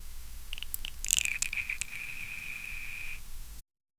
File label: matcha whisk swirling mat, culinary